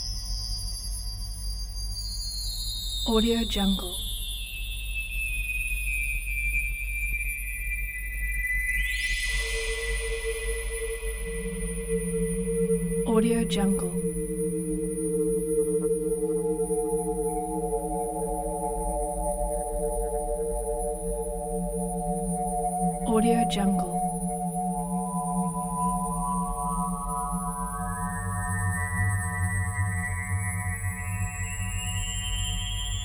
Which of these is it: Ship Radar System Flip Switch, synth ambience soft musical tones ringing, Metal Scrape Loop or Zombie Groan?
synth ambience soft musical tones ringing